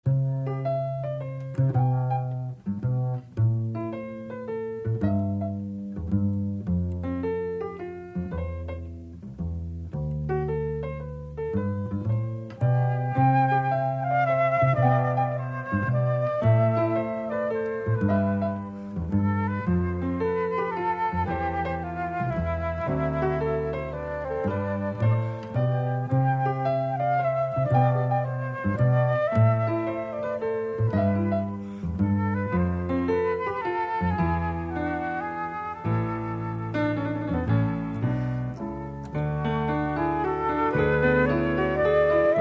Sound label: bgm